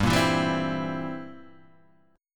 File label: C 7th G